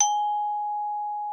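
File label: xylophone G#5; Xsample VOL-4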